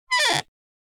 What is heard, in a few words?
Door - Wood Creak - Short; Hollywood Edge; sound designer's toolkit v2